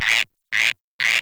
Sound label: Close River Frog; Ambience; Frogs